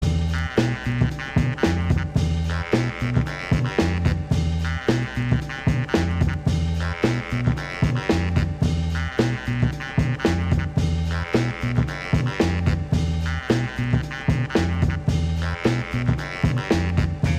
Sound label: THE DRUMS